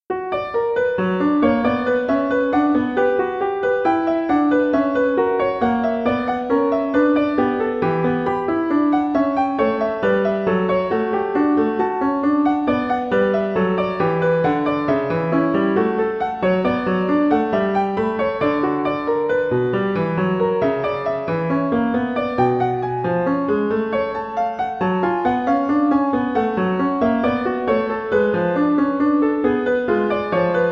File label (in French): allemande en si mineur